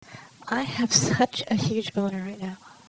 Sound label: surprise